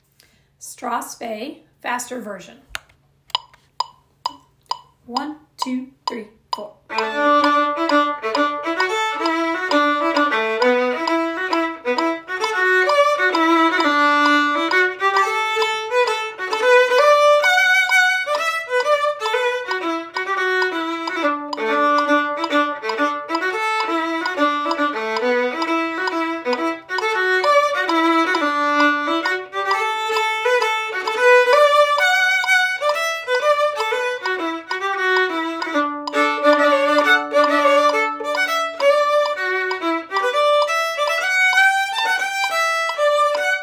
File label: Strathspey faster version